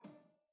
Harpsichord Low Release Far D3, Harpsichord, Flemish; Chordophones; Releases